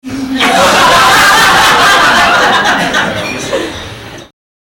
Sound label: Laughter; The Unknown